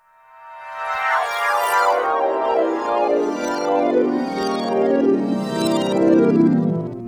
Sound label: Freeze Jingle